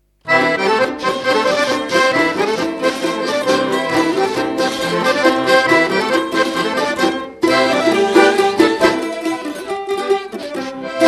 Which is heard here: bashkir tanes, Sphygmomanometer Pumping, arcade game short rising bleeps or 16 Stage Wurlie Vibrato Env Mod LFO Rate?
bashkir tanes